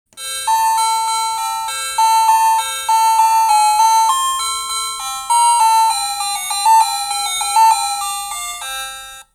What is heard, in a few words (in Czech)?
melodie